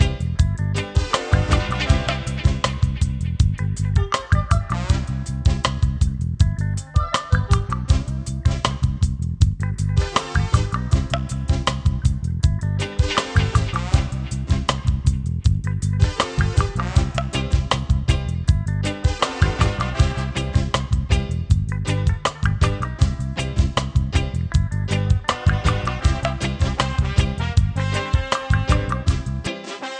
reggae

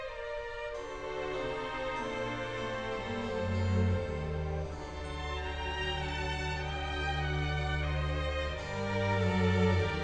classical

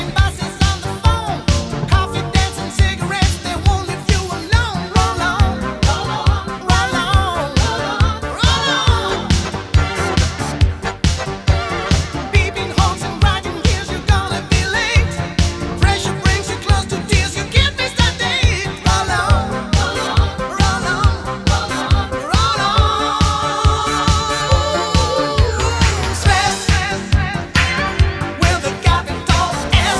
disco

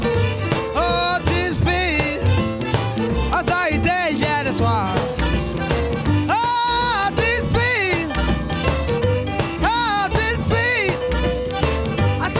blues

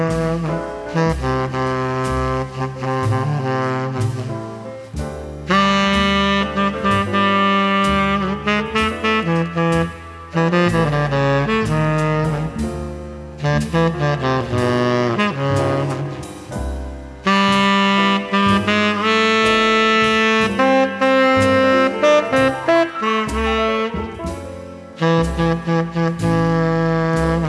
jazz

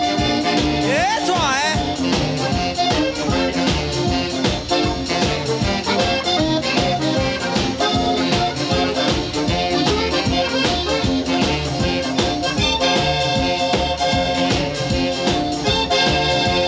blues

